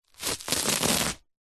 office packaging bubble wrap roll rub finger run through layers